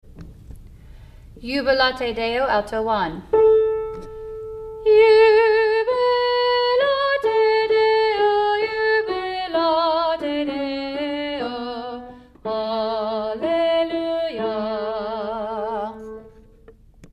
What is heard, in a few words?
alto